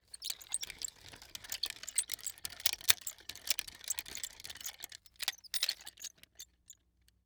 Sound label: Nunchaku Spin Around, weapons; AdobeCC